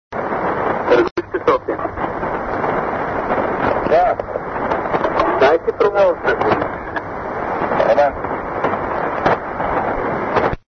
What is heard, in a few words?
5622 kHz; targoyishte wkg bul-52-sofia; europe